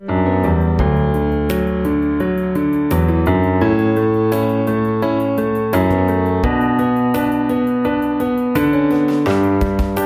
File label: Classic Chords